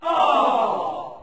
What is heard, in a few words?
"oh" crowd disappointed; ooh ahh